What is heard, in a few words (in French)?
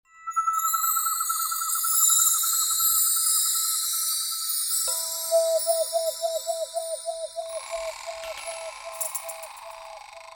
AMBIANCE CORDE